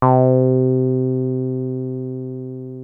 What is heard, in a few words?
303 C3, TB 303, Synthesizer